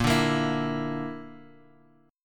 Bb Major Flat 5th Bb down Guitar Open G, chord

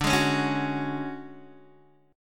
D Major 7th Flat 5th Guitar DADGAD, chord

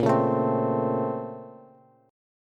A sharp Minor Major 9th, down, Bass

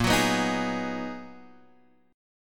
B Major 13th A sharp

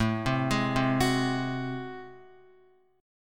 A Minor Major 13th, down, Guitar; arpeggiated; AmM13; JGuitar

